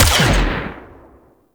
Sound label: Shape Blast Shotgun 3D Fast Fire, Weapons; Warframe; Corpus